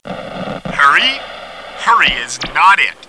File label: Hurry; Mia's Reading Adventure The Search for Grandma's Remedy